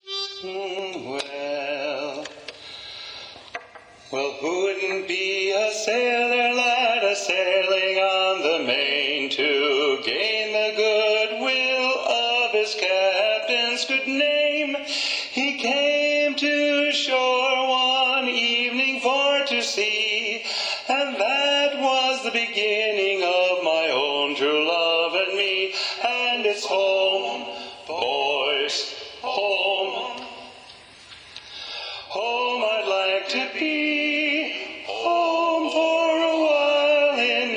Lead Predominant; sea shanties; Home Boys Home

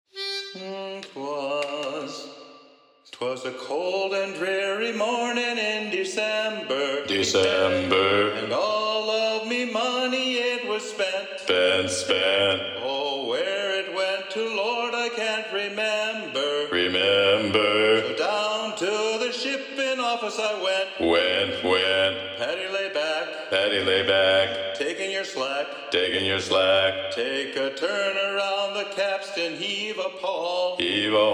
Bass Predominant; sea shanties; Paddy Lay Back

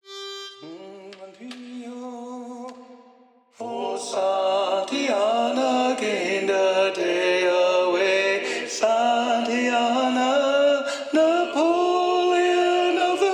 Tenor Predominant; sea shanties; Santiana

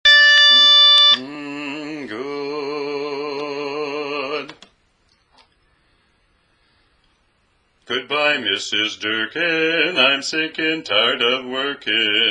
Bass; learning tracks

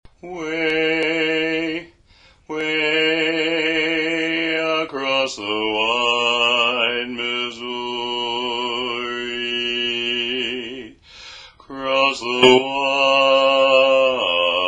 Tag - Bass; learning tracks

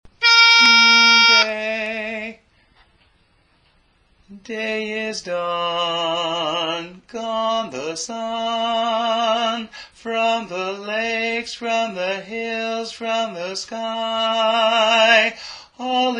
Taps Baritone

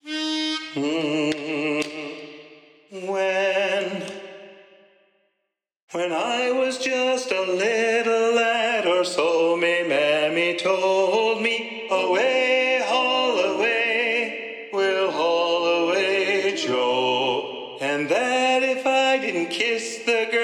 Lead Predominant, sea shanties

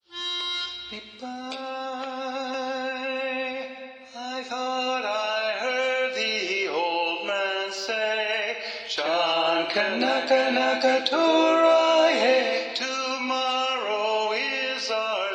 John Kanaka (Tenor Predominant); sea shanties

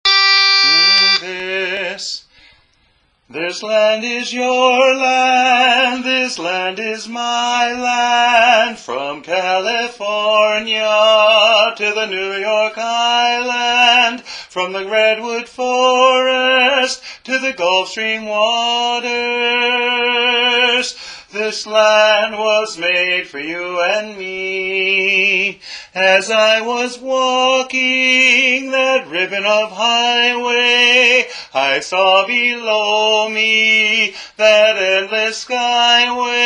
Lead; for god & country music

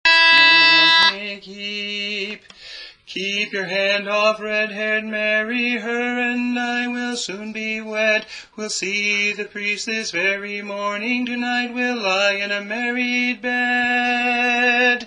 Red haired Mary (Harmony); learning tracks

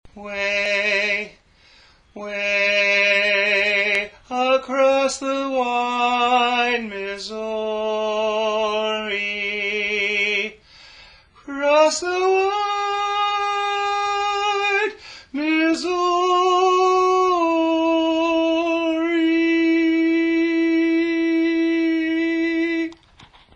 Tag - Tenor; learning tracks

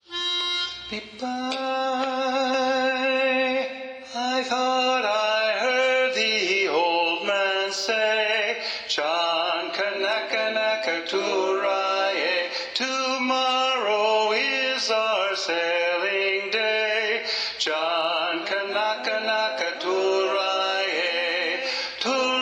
Lead Predominant, sea shanties

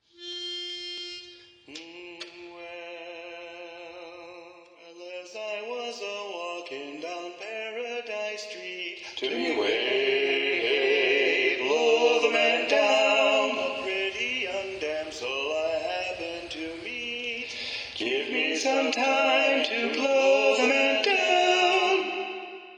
Tenor predominant; sea shanties; Blow the Man Down

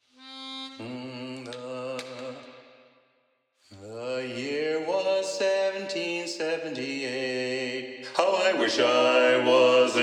Bass Predominant, sea shanties

